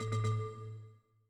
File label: Windows Hardware Fail; Pearl